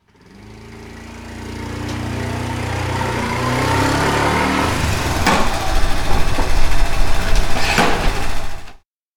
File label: bike pull up; anemoi